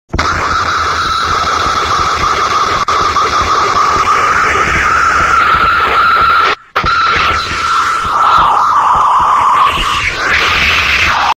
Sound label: screaming